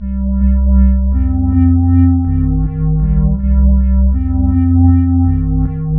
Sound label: Synth Turn; Sonic Foundry (Sony Creative Software); Knutrix Drum & Bass